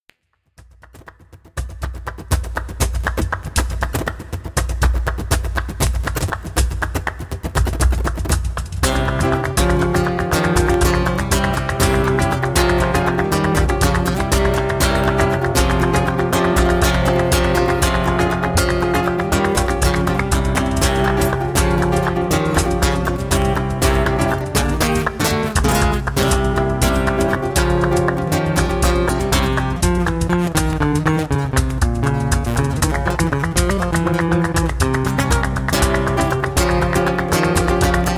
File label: Buleria, flamenco band